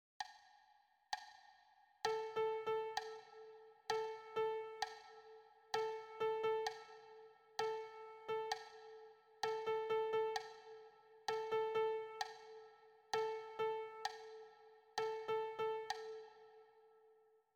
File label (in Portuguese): celulas ritmicas simples